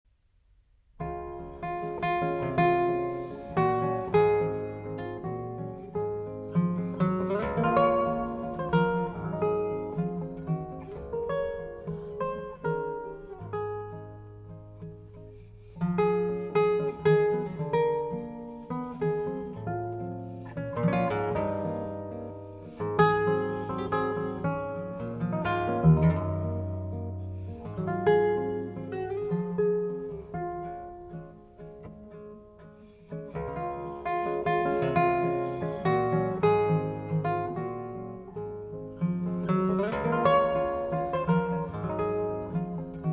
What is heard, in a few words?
Catalan Folk Songs No. 6, Lo fill del rei